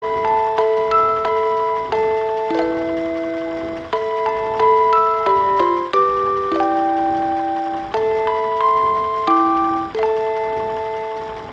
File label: Music Box